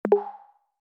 Hint, notifications; HTC One-X

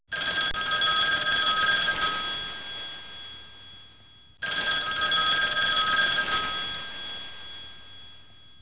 Telephone

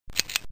camera click

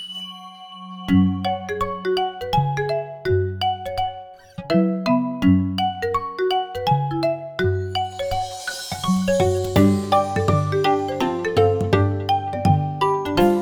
ringtones; LG; Smart Folder X100S; Kaleidoscope

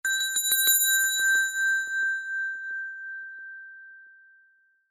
Ting-a-Ling; Samsung Galaxy A5 2014; alarms

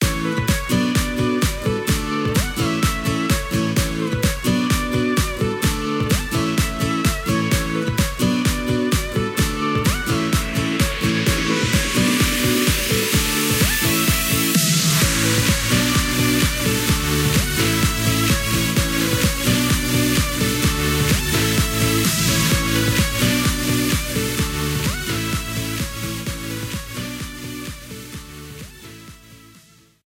Alarm Everybody Jump; Samsung Z2; Ringtones

